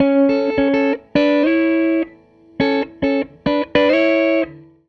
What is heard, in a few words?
Gretsch Guitar Loop